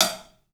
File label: HAT, CLUBHAT, 3D Drums; 3D Sound